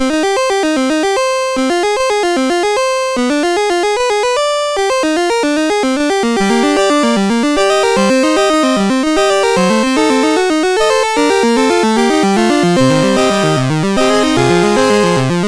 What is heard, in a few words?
Fugue in C Minor, MOS6581R3; Wesley Noles